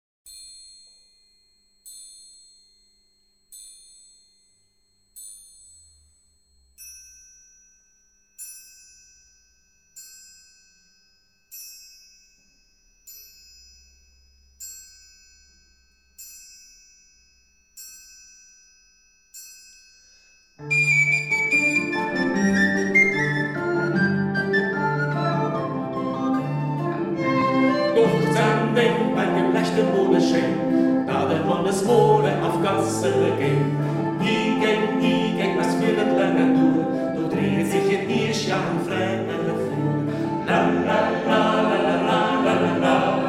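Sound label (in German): Volkslied, Umgangssächsisch, Kleiner Chor; scharosch; hegt wird gesangen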